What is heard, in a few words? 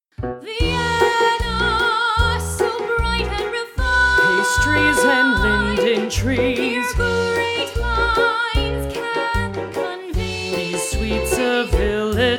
vocal mix; vienna 1913